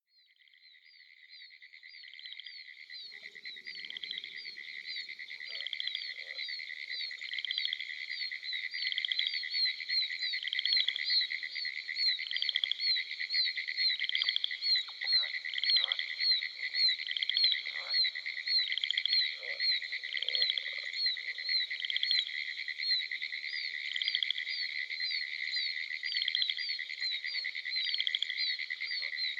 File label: southern chorus frog pine woods treefrog oak toad gopher frog; dan gibsons solitudes